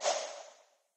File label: rafaga; bouncy golf